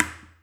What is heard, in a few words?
ETHNIC DRUMS; Spectrasonics; Liquid Grooves 1 & 2